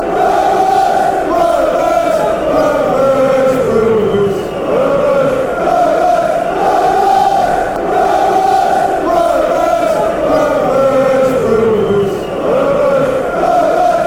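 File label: rovers rovers, football chants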